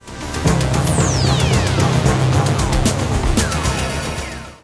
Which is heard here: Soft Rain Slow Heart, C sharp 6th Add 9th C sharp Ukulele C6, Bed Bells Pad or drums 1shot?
drums 1shot